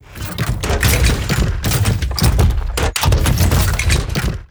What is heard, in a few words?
shared movement, combine wall blades